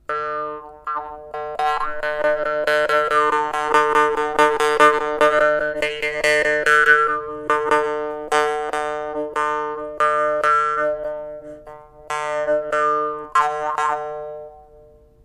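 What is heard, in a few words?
morchang brass, marchanga brass standart; mor4ong brassm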